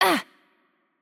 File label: Hurt Light, ro RO; bloom minigame